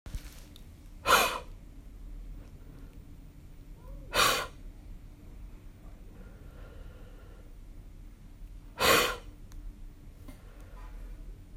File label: staged huffs incorrect